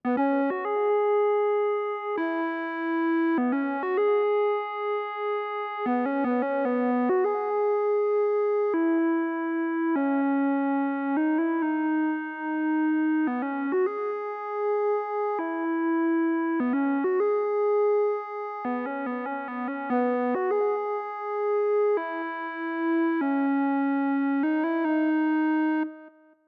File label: square lead, Smooth RnB, F#min